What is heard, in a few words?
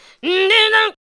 ADLIBS